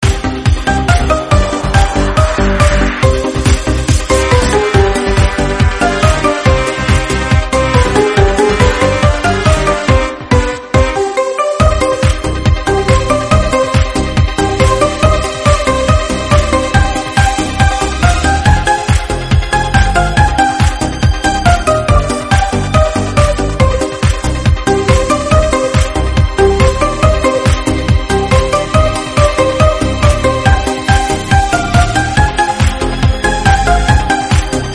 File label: music only